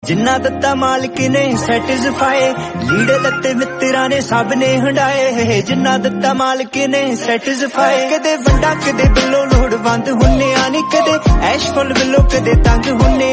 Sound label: punjabi song